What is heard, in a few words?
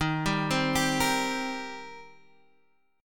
Eb 6th Eb down Guitar Standard; Eb6 arpeggiated; JGuitar